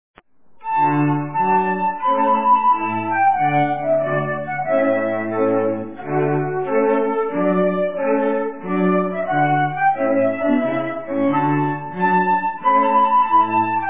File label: Trumpet Tune